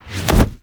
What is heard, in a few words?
Camera Latch; Warframe; Chimera Prologue